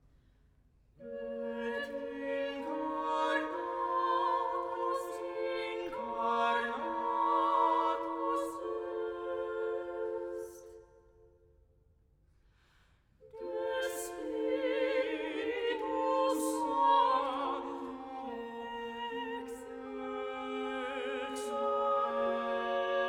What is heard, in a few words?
Et incarnatus est, Missa Circumcisionis; collegium1704